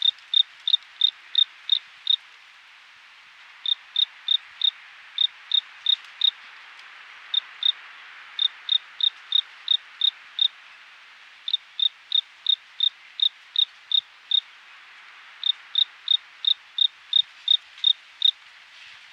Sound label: crickets; Fate Undiscovered Realms